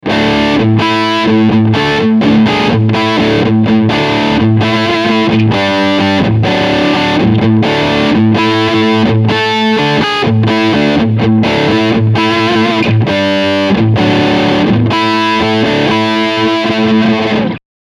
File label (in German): Riff Overdrive am Amp